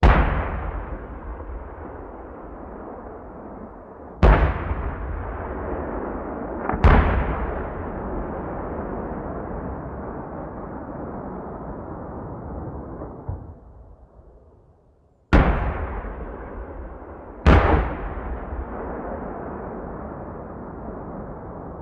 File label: Cannon Tank; Sound Ideas; Guns